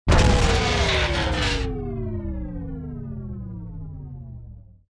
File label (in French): generateur powerdown; La-Fabrik